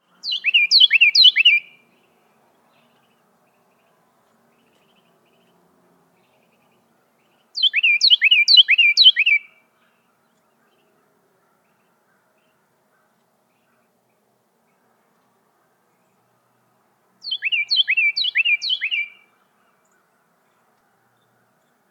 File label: carolina wren